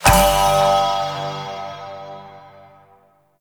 Dice Result Single Six; Warframe; Koumei

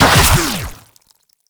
Laser Discs Proj Return Shot; Infested Lich; DJ Rom

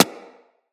Bullet Impact Generic

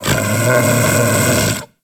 Kubrow Tame Sleep Idle Snore K; Warframe

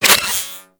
battery charge; Cowgirl

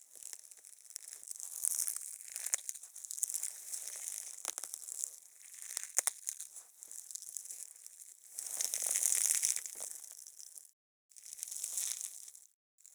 Infested Eris Exterior Critter Loop

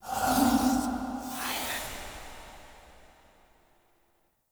Tarot UI Card Clicked Layer; Warframe; Harrow; Tarot Emotion Choice

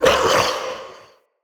Male Vocal Pain; Enemy; Mockery; Mockeries

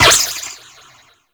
Ricochet; Warframe; Cowgirl

